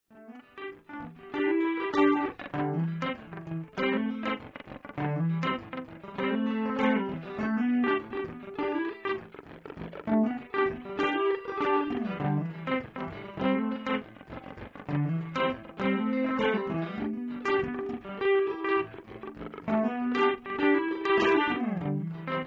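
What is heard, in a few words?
Memory chorus rhythm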